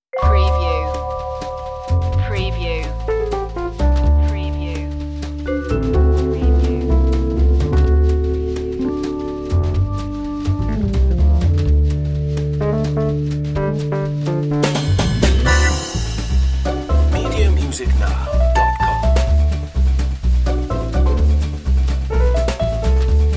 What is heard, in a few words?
life style tv light comedy